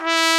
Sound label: E2, BRASS